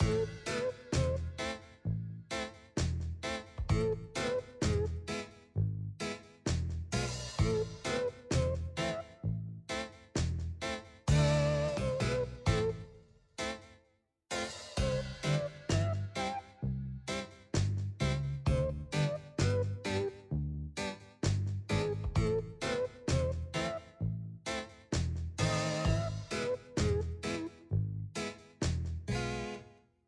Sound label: Study 130bpm; Count Arelles